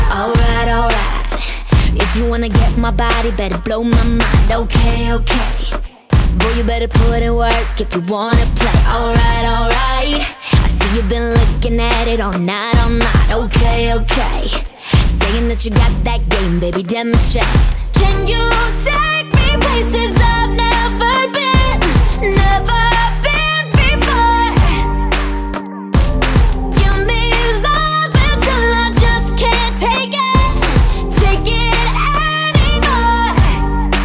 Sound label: English Ringtone